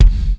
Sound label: GATE BD, Drums Acoustic; USB Soundscan vol.10; AKAI; 90s sample CD; ALL BD